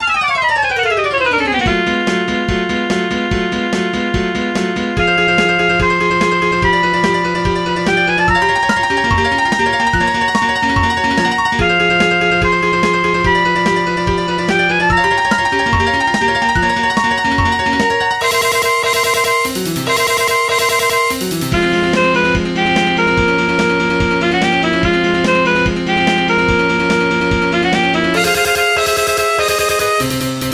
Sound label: Battle Music